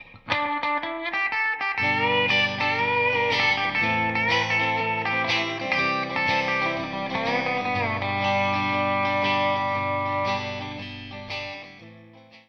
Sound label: country